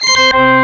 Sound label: Good Pipe; musicmousedemo